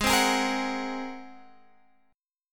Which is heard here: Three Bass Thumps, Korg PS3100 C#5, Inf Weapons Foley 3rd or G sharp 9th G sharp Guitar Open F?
G sharp 9th G sharp Guitar Open F